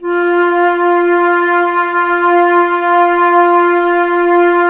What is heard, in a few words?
flute, Wood Winds, Flutes